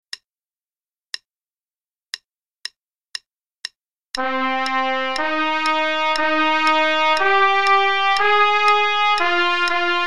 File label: Play a long in Ab (trumpet and click, qn=120)